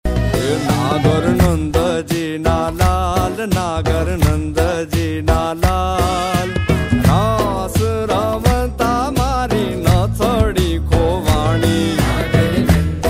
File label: gujarati ringtone